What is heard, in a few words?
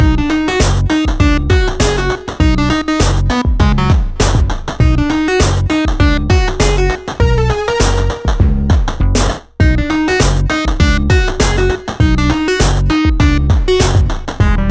Clumsy Platformer Loop